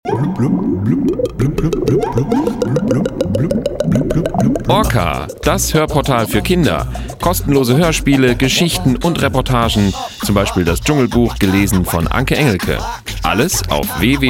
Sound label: Beatbox